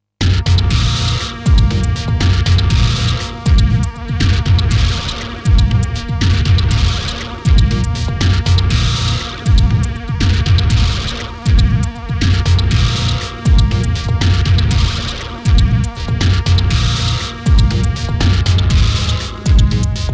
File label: MX61; DestructME